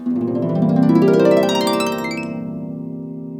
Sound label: gliss up, harp; InVision Interactive; Lightware VOL-1; Instruments & Percussions